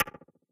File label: click back; Source Engine; Portal 2